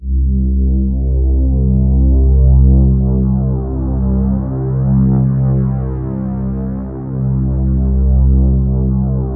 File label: C2, Synth Leads